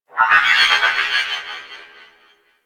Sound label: Corpus Ship Robotic Random Calls; Ambience; Warframe; Corpus Ship Remaster